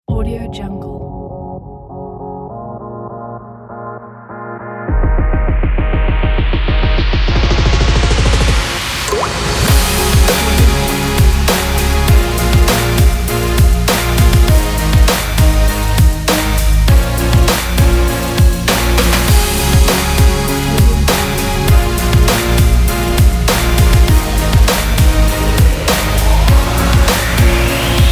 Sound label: future house summer